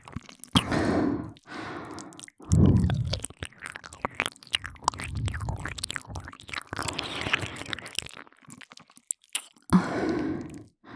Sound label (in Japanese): ぐっぽり 耳舐め 手コキ, ASMR; プロモーション音声